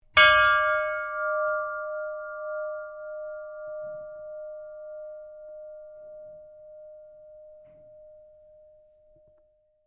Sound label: SHIPS BELL IRON RING; Flinders; Various ships bells; Boats; Vehicles